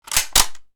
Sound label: gun cocking; mechanical